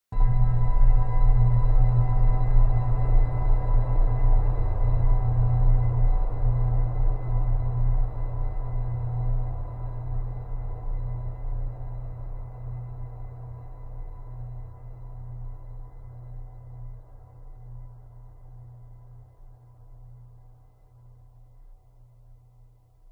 horror hit soft dark eerie sinister bell like chime long reverb